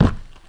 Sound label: Foot Step Orc